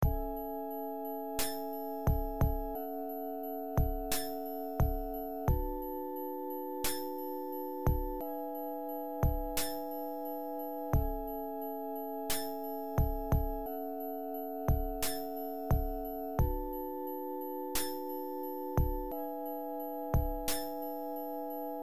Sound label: lofi